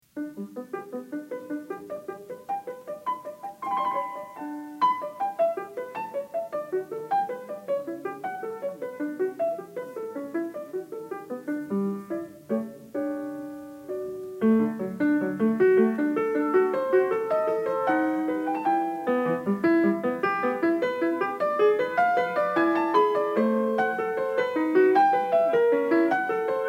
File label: Partita for keyboard No. 5 in G major, Tempo di Minuetto; Bach, Johann Sebastian; BWV 829